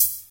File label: Old School Clean Closed High Hat Sample A sharp; hi-hat